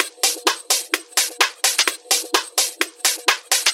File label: House Loop, 128 BPM; Vengeance Samples